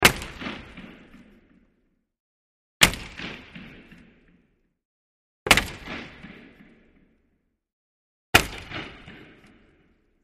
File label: Hockey Stick Glass Im, Hockey Stick Hits Glass; Hockey: Stick / Glass Impact ( 4x )